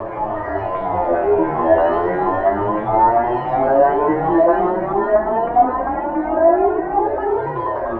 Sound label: Guitar Textures